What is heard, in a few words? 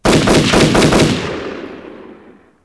fire rain